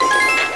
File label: blue coin; GAMECUBE; SUPERMARIOSUNSHINE